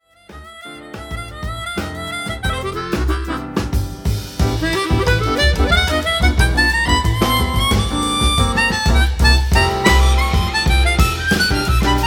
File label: samba